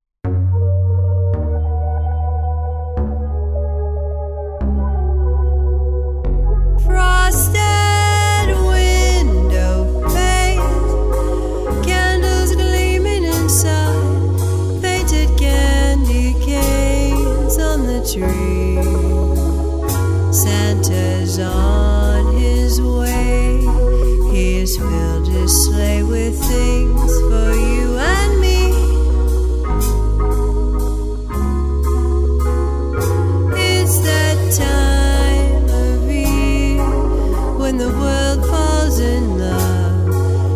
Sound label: xmas waltz